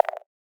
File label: Perc, Psytrance; Hats & Percs